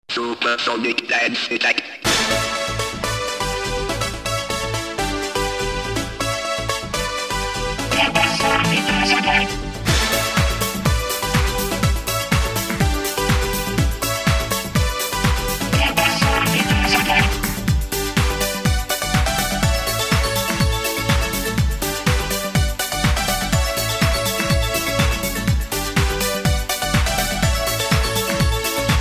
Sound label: Techno; Sonic